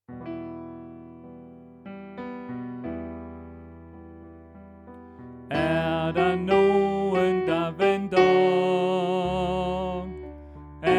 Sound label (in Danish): Sopran